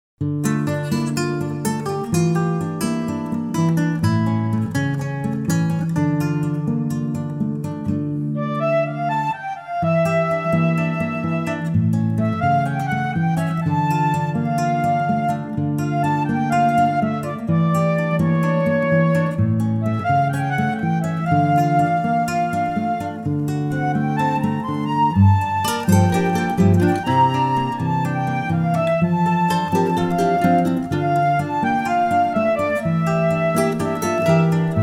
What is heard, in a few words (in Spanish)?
Pista con melodia instrumental